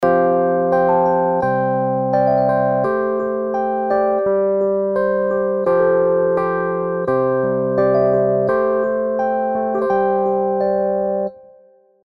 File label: Arturia Stage 73 V2 Dist TUBE CULTURE Mixbus Mid Focus